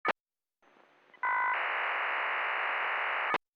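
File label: 450 MHz search; poisk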